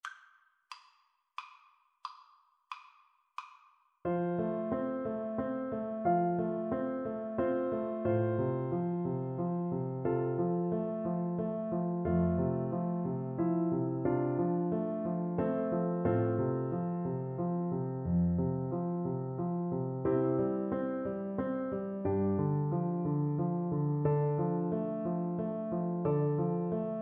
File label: French Horn